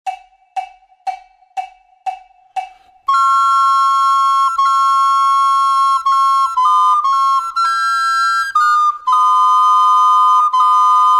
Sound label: GERMAN WALTZ DUETO